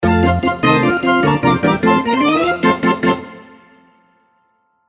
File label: win big; Fluffy Arcade: Doubleup Ducks LuckyTap; Eyecon